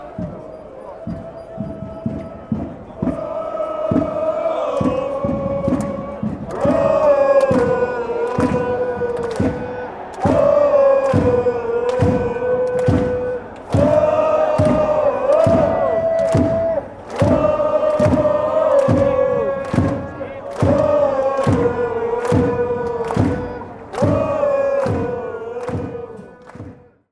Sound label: woooah, fan chants